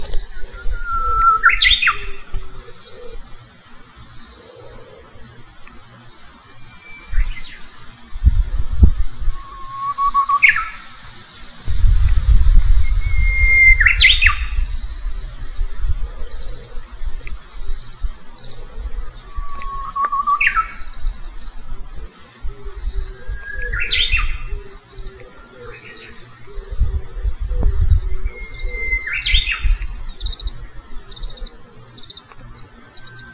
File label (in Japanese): ウグイスの鳴き声